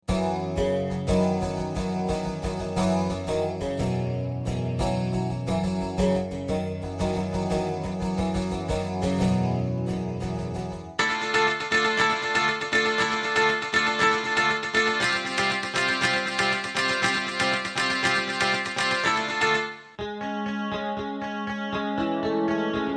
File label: Guitar Clean Jazz